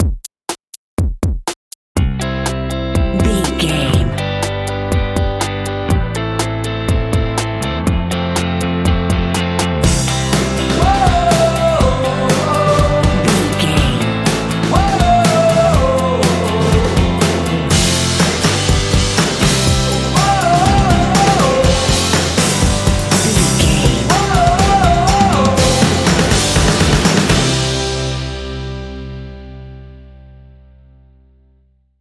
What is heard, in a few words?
indie nights vocal